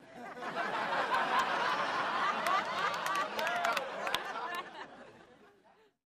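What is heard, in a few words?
Crowd 100 Medium Burst Interior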